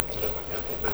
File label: Dog Pant